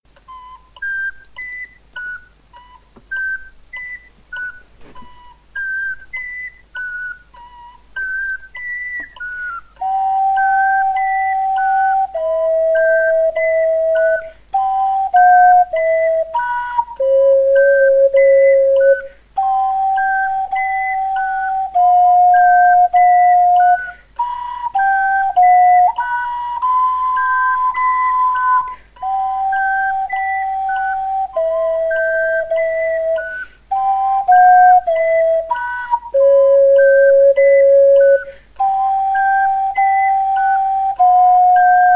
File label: ocarina